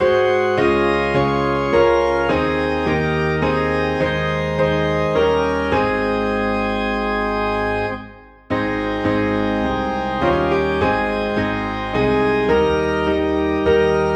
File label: Piano and Organ in A Flat